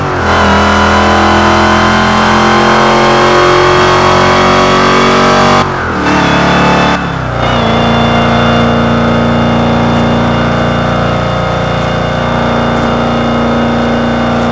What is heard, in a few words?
fourth cruise, vehicles; bowler